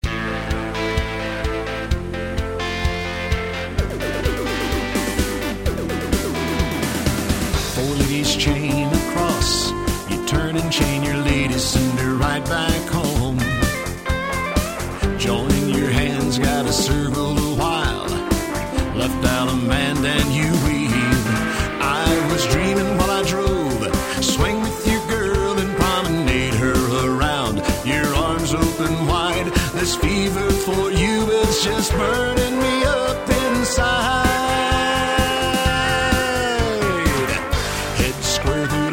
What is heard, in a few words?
vocal